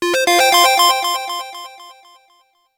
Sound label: ALERT